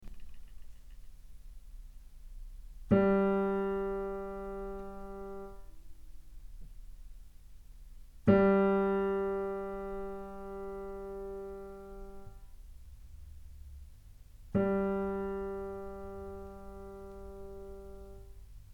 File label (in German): Stimmton G 440; Play-Along für Instrumentalist innen